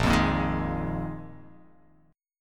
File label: A sharp 7th Suspended 4th A sharp